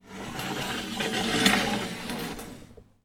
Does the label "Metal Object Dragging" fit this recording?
Yes